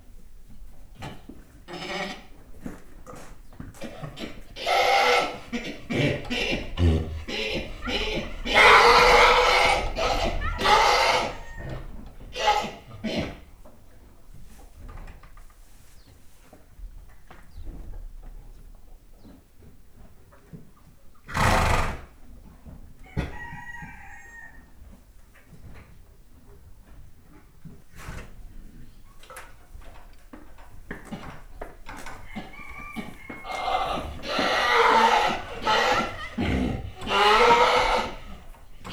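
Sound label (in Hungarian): hangoskodik, pónió; parasztudvar; póni ló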